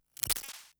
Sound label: Glitch, noise